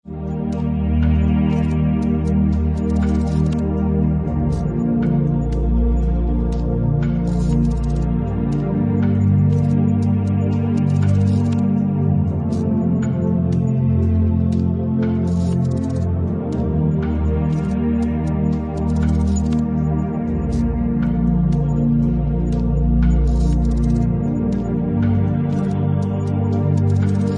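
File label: ambiance cinematographique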